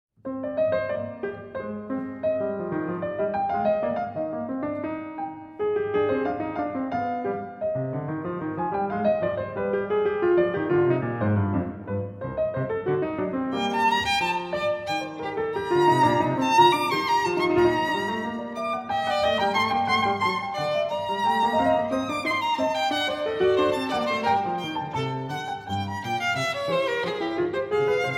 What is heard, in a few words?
Sonate Für Clavier Und Violine, Allegro; Bach